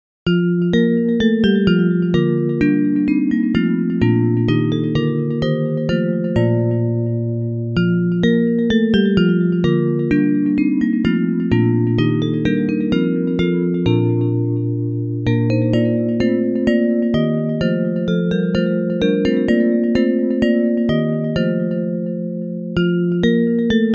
Hosanna Loud Hosanna Bells

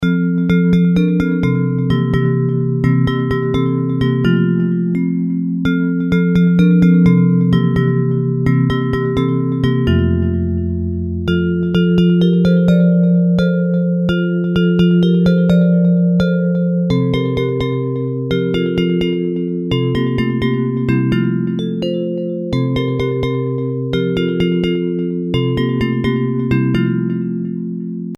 Bells

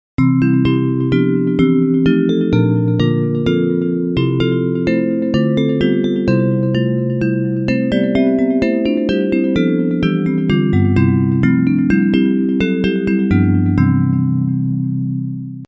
In Christ There Is No East or West Bells

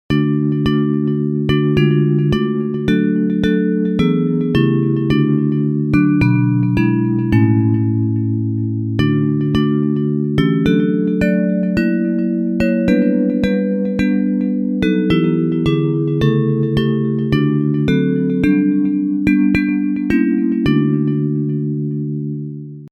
Dear Lord and Father of Mankind Bells

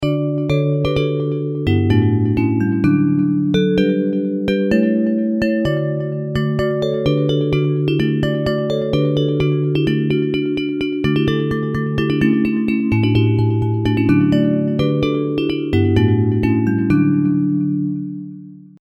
Joy to the World Bells

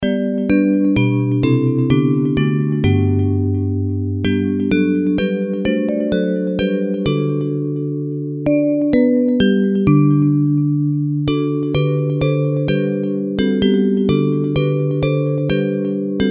Bells; Christ for the World We Sing

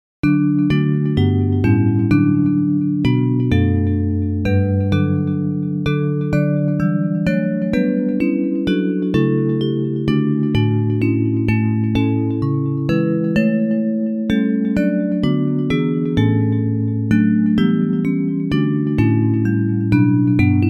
When I Survey the Wondrous Cross Bells

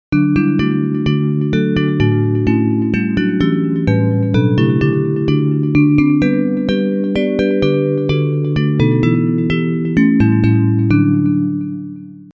Children of the Heavenly Father Bells

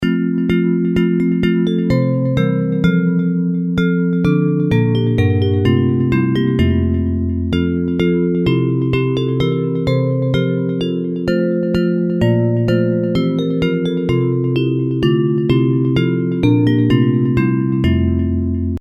Bells, hymn

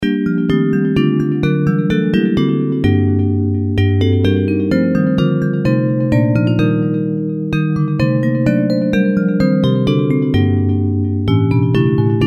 Bells, Traditional hymn

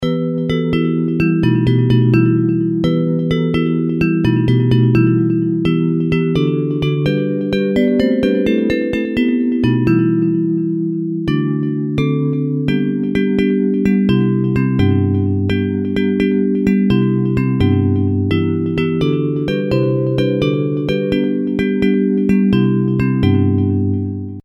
We Three Kings Bells

